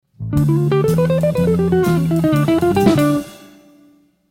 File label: Melodic Line